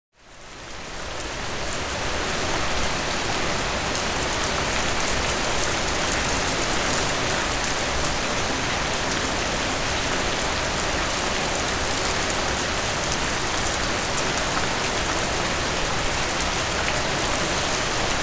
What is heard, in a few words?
15 minutes of rain sound for relaxation and sleep; study